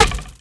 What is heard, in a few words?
arrow hit; megaglest